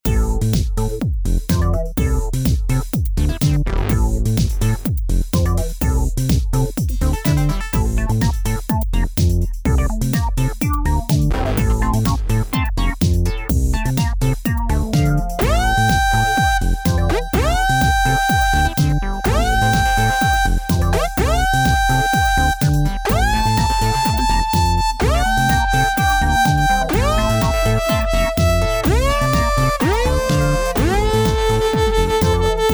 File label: Syn X, Elka Synthex